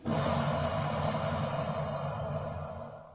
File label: croc resting; minecraft; technic